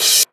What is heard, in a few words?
Ride, Cymbals; Vengeance Samples; VEH2